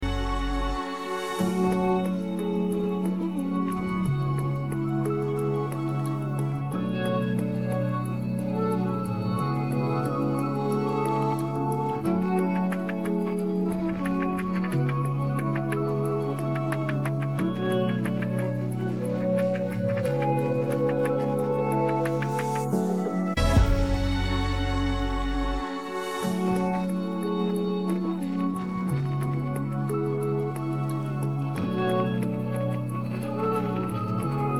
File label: no vocals